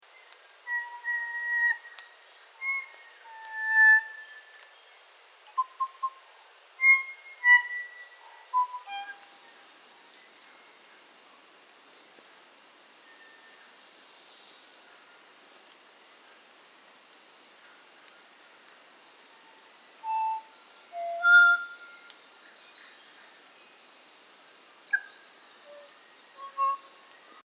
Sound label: Kokako; Pureora; Bird Calls